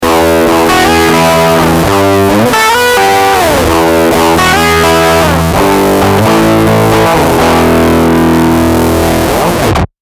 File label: Git. + Oct. + Square + High Gain; EHX MS